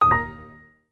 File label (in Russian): oshibka error sistemnij zvuk windows xp